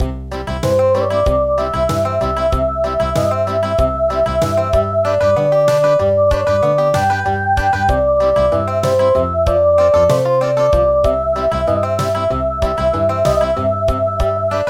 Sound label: Yemen Song Country